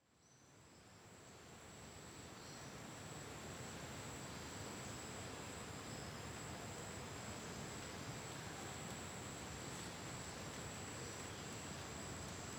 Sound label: Amb Forest, Colombia, Caribbean Coast; Nikkö Barrera-Amaya